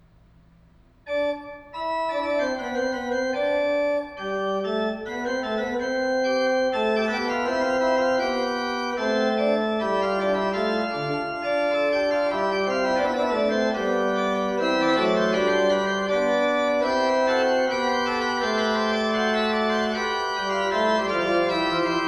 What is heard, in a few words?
Fugue, Organ; Magnificat tertii toni; Johann Pachelbel; Complete Organ Works